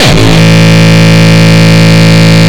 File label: Longkick, kicks